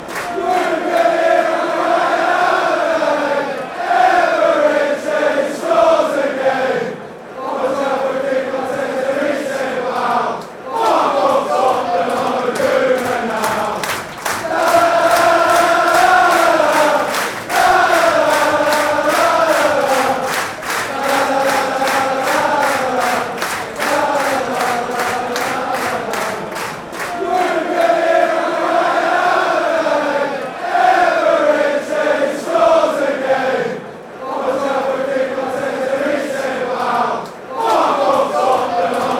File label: fan chants, england